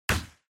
Motion Grab Dry; モーション 掴む; ドライ

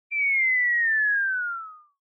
Motion Falling Whistle Mid

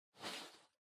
Loose Fill Packaging Far Noise; 梱包材

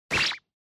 Motion Agility Single; モーション 俊敏; シングル

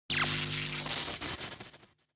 Electric Shock, Mid

